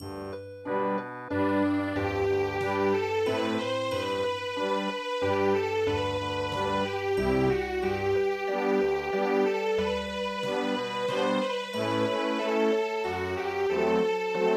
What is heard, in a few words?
Vienna Waltz; Mothers Eyes